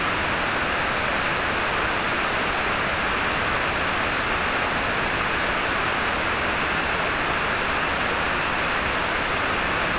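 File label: avionics blower, Aircraft A320; Aircraft A320 - 321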